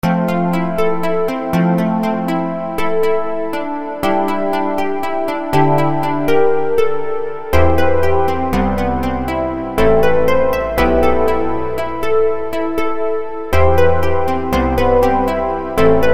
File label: Stars ringtone